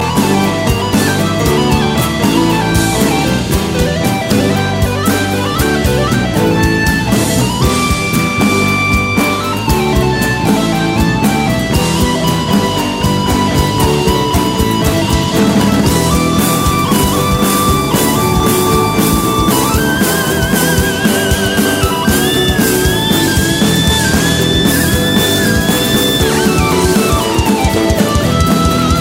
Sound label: Guitara Electrica